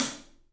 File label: PAH14, 3D Drums, LITE PAIST H; 3D Sound